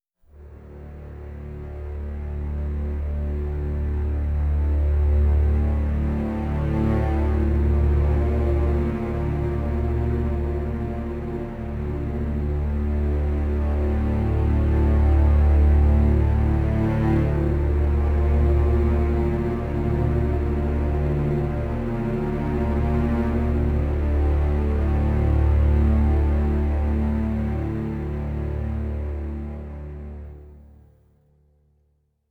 drone

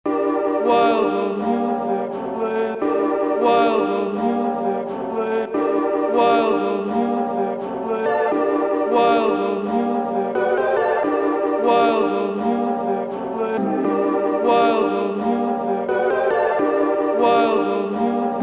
drum and bass

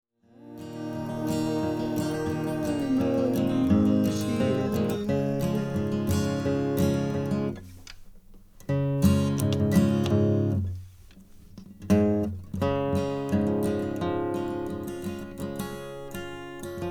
plays guitar

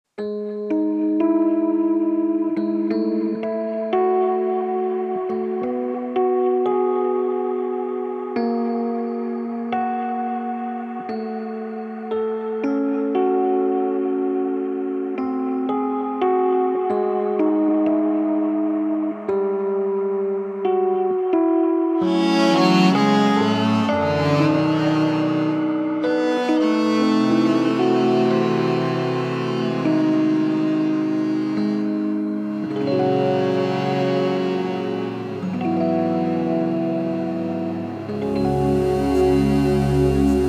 symphonic version

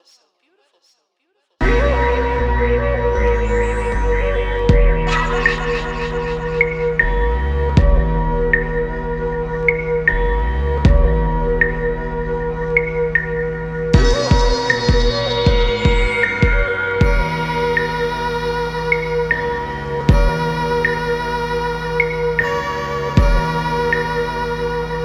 instrumental

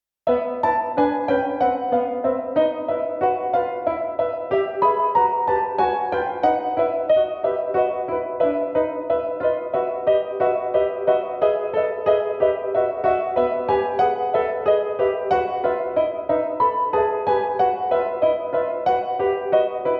piano sonata; second movement

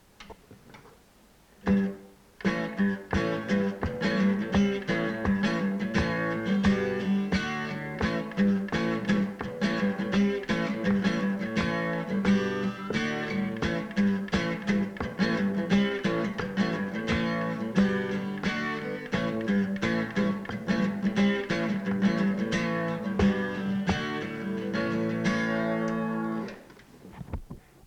acoustic instrumental

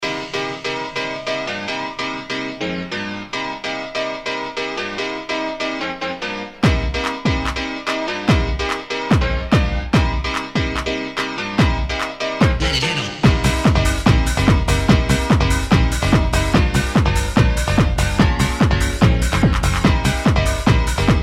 piano

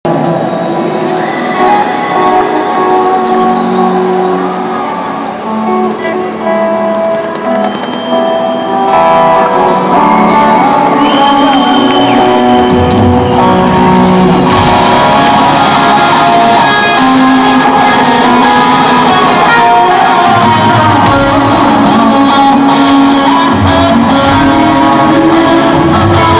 guitar solo live

